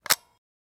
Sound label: Shutter Click Insta